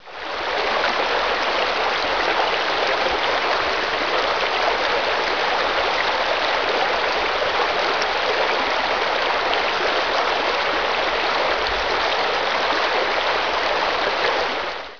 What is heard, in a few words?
river